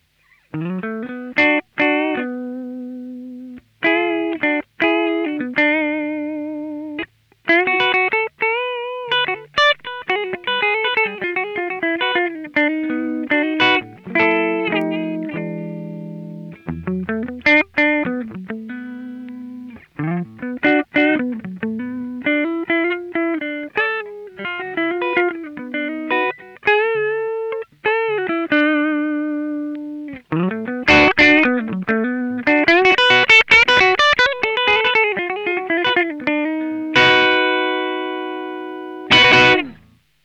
PRX908 Amplug AC30 Clean, guitar; LP R8 -> PRX908 -> Amplug

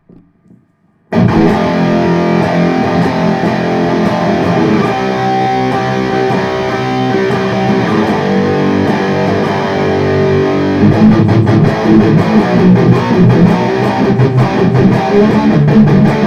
LP92 disto 498T bridge, gibson les paul

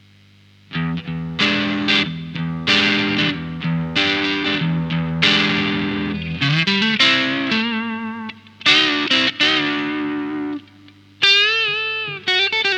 Crunch Preamp; preamplis en rack

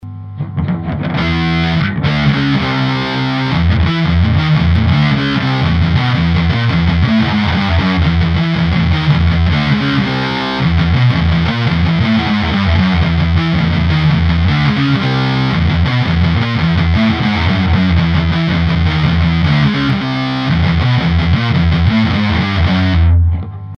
son disto; amplis tubes

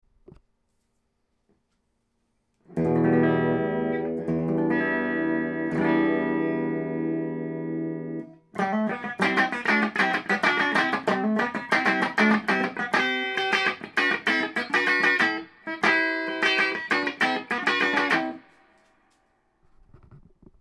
Gibson Les Paul clean 498T bridge

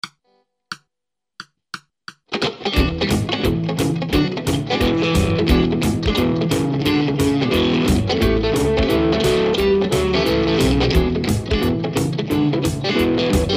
blues shuffle solo

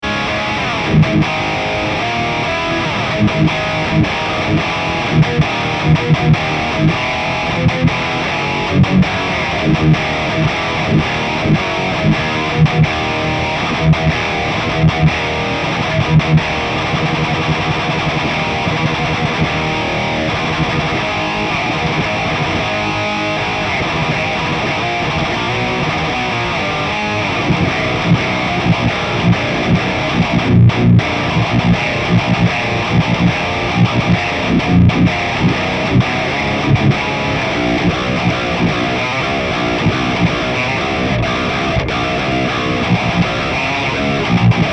Kemper test, Effet guitare; Kemper Profiling Amplifier; TappIratus

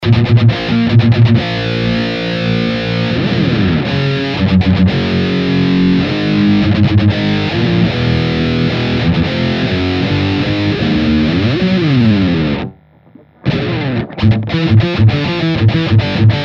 treadplate pre; POD HD 500

